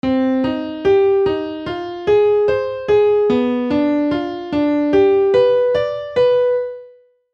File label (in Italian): arpeggio con la terza risuonata